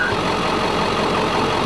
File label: idle Engine